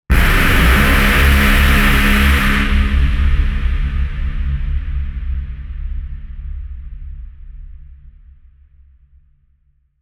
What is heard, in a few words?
Tension Builder Impact